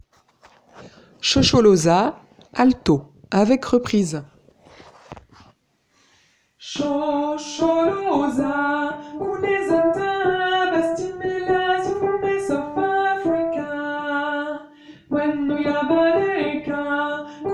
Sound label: Shosholoza alto; Traditionnel Sud Africain